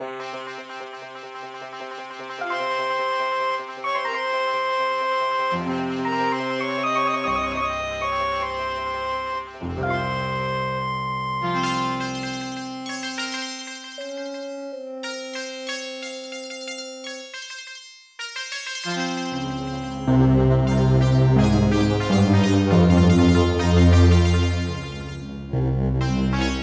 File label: Intro, Alesis Harmony 32; Prince of Persia 2 - Intro